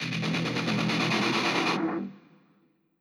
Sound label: Loop Break